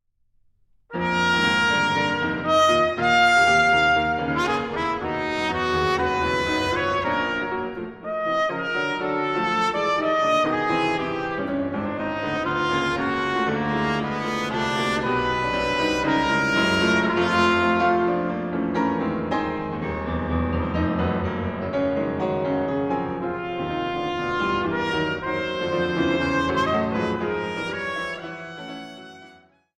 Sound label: Sonata